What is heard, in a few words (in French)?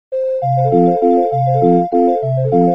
melodie arpege; fioritures